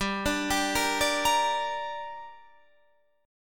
G Minor G, Guitar Standard; arpeggiated; 15,17,17,15,15,18; JGuitar

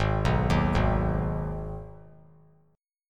Ab Minor Ab down; Abm arpeggiated; JGuitar

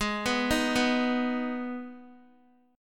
G sharp Minor G sharp down Guitar, chord; G#m arpeggiated

